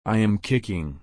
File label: kick